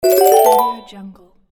casino win

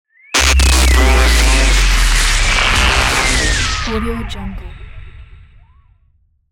radio imaging fx

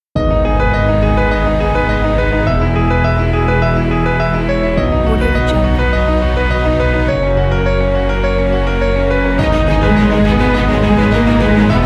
uplifting epic cinematic

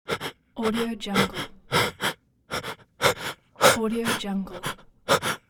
male scared breathe

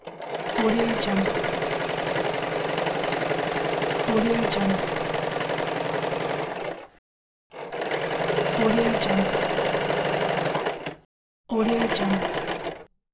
sewing machine

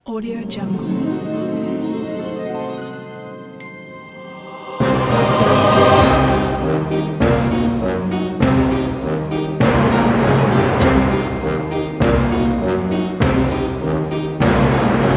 halloween fun; penguinaudio

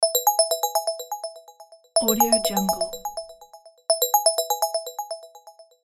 soft ringtone

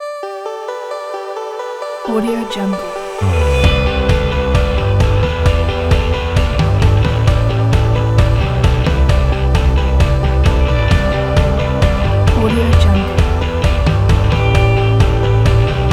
motivational inspiring indie rock

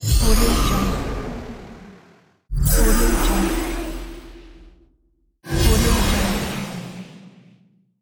magic wind spell

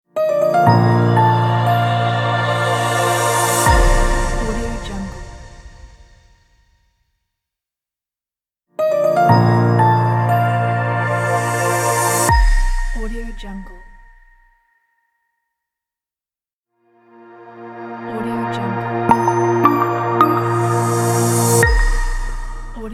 sting logo reveal pack; wolf-music

